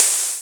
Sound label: Ride, Dubstep One-Shot Drums